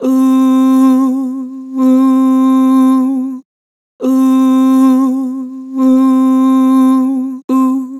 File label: Vibrato "Ooh", Harmor Vocals